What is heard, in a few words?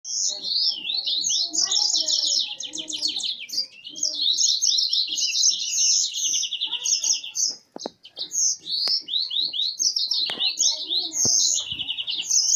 Canary, Bird Songs